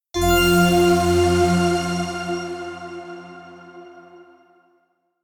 Jingle, Treasure Box; BOXBOY! + BOXGIRL!

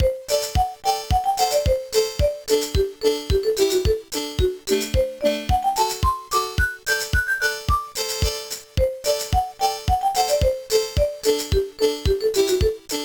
BGM; majokko cream chan no gokko series 2 kisekae angel charisma tennin ikusei game; gba

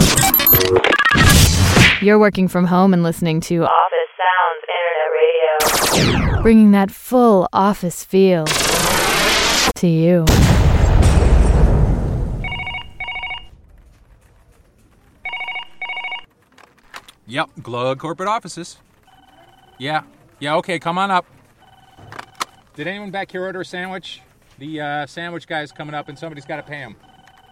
Office Sounds Radio; You Don't Hear Jack 2

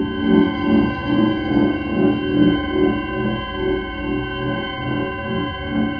portal loop

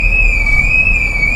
Alarm Sound Store; car simulator 2

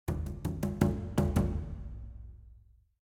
Music Battle

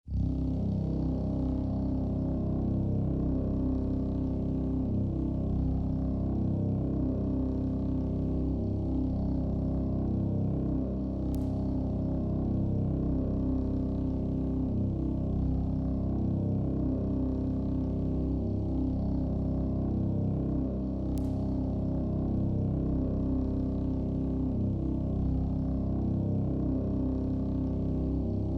Hum Quiet

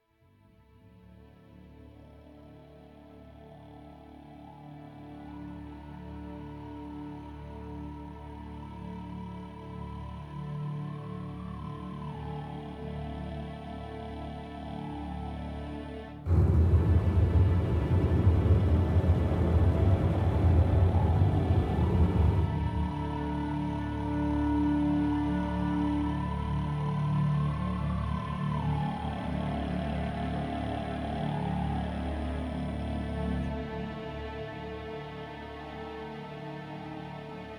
loop Stealth; the walking dead michonne 2 give no shelter

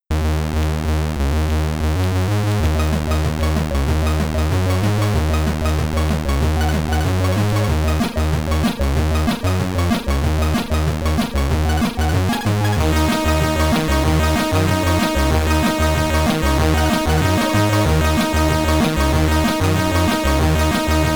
Shop (SID Stereo); Cyberdyne Warrior; Commodore 64

